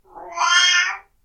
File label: old cat